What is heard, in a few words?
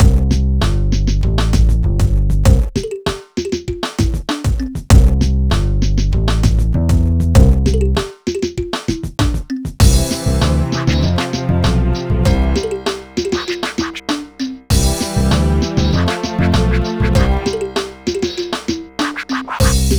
Music CASUAL; Super Scribblenauts 2010 NDS